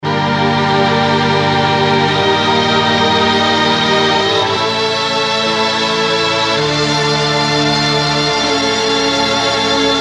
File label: string section